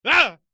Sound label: Scream